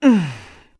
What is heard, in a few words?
Stretch; Link